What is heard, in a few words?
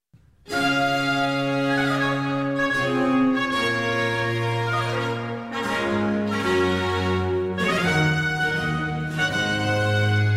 Overture-Suite in D for Oboe, Trumpet, Bassoon and strings; Telemann, Georg Philipp